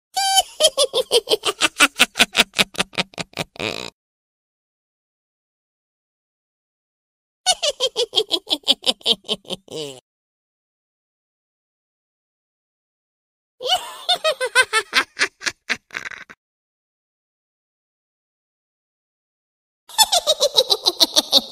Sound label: witch cackling